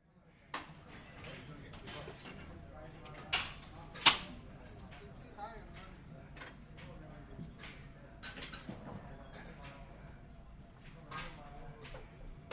Gym; Weight Room Ambience With Machine Movement And Male Walla.